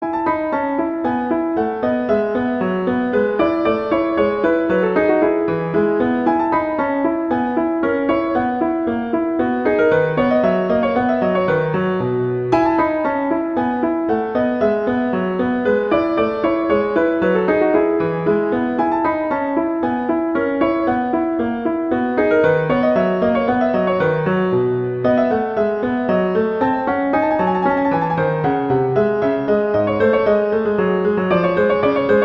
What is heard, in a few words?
Polonaise; Bach; French Suites; Suite VI